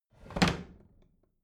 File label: bathroom drawer close heavy